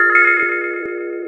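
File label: grenade bobble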